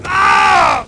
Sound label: yell; Nukem3d